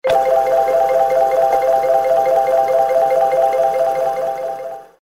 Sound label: turntable spin